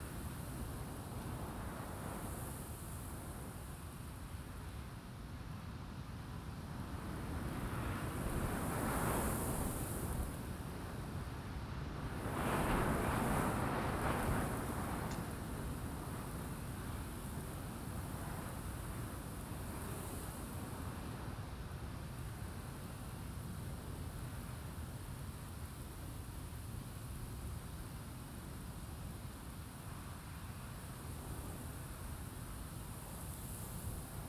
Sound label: ambience wind desert; Rust